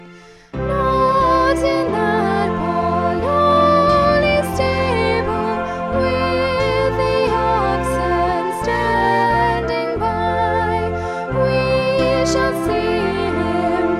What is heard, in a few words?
descant